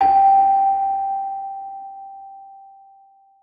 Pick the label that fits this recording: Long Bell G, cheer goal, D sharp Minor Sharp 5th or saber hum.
Long Bell G